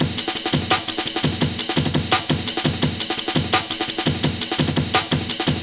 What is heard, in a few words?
Kickin Break, Drum & Bass, Beats & Breaks